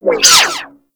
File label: Corpus Energy Proj By